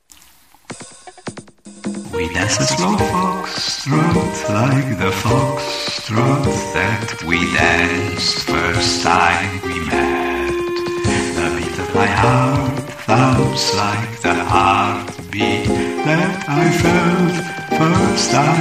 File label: A slow foxtrott